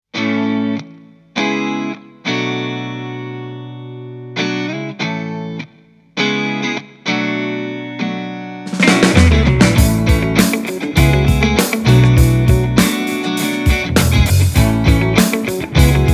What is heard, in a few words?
70's Lite Rock with Guitar and Horns